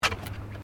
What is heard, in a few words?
Footsteps Bare feet Wooden Deck Step; Footstep Foley; Footsteps (Sound Effects:Human)